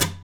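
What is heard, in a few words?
scrape, guitars; Spectrasonics; Hans Zimmer Guitars VOL-1; TAKA FX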